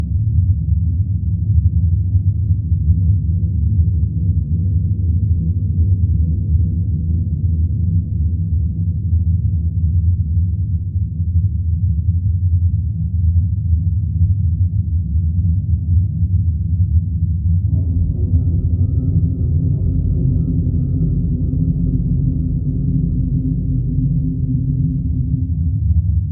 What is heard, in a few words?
gravity gen hum; Ambience